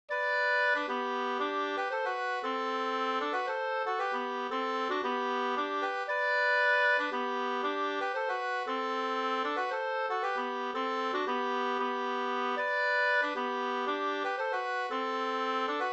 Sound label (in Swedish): polska Bodapolska